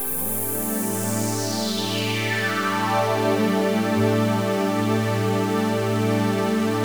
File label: TECHNO PAD 140; Keys